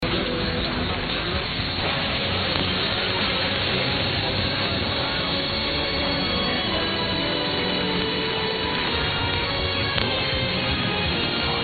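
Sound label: Melbourne FL, radio; WDMC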